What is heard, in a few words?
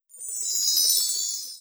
chime loop; Politburo